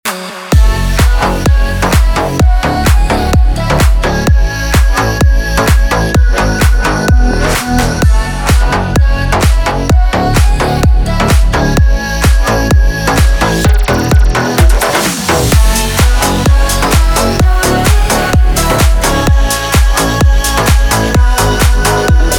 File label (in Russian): Отбивка